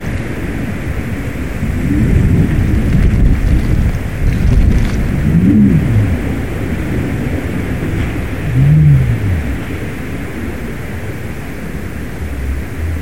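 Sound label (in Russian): звук во время урагана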